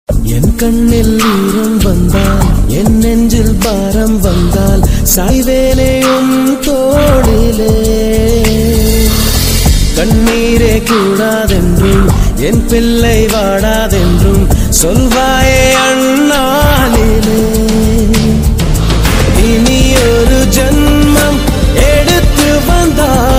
mother love bgm ringtone

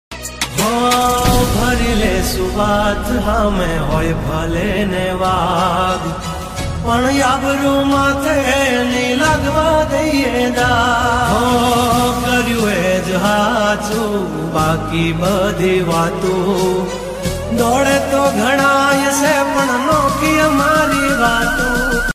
gujarati ringtone

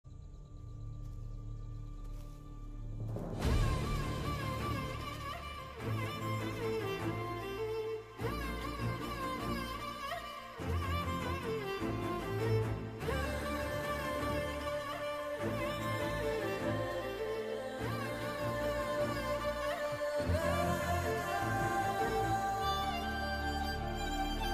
love bgm; tiger nageswara rao; ravi teja

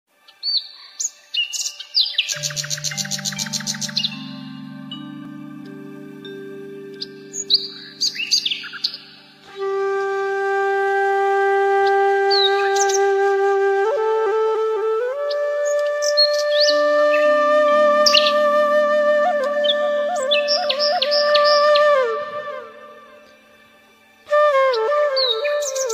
music nature background

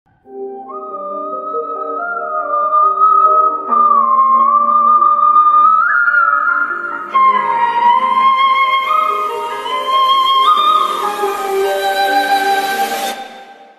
remix ringtone

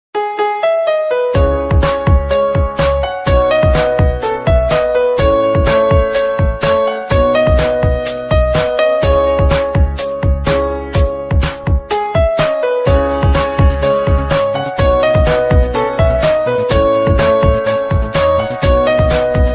love ringtone instrumental ringtone melody bgm

